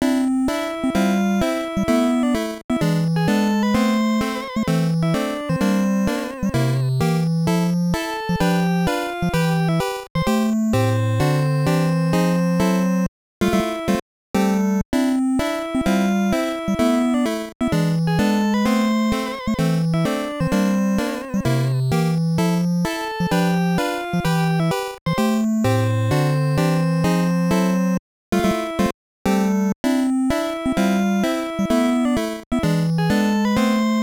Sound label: Name Entry BGM (alt); Famicom Mukashi Banashi: Yuuyuuki; FDS